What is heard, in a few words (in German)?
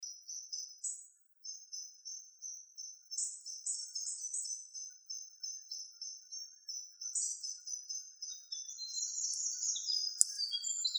Lichtung Soundaufnahme Botanischer Garten Marburg